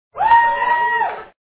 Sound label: cheer wooo